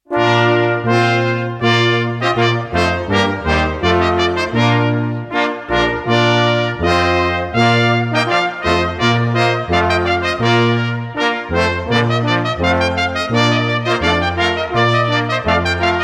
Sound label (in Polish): Hejnal; Marcinek; Krotki